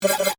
Synth, Button, Beep; Science, Fiction; Communications

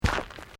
Footsteps Boots Gravel Fine Step; Footstep Foley; Human

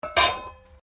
Bowl Metal Stacking; Various kitchen bowls; Metal (Sound Effects:Materials)

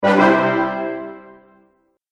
Ta-Da Brass Fanfare; Cartoon Sound Design Sound Effects

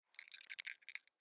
Sea Shells Move, Sea Shells In Hand Rub; Foley; Scrapes; Objects; Blastwave

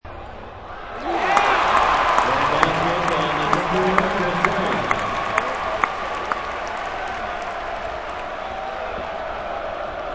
Arena crowd applauses multiple times; Part of Arenas (Sound Effects:Backgrounds)

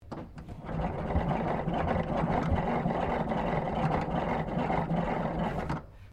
TELEPHONE OLD DESKPHONE CRANK OPERATING; Various old telephones; Electronics

